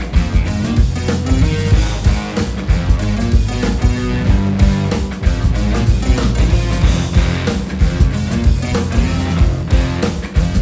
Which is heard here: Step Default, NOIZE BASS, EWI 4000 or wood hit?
EWI 4000